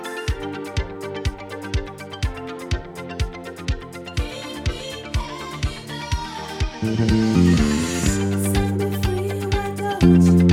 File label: bass; gitaros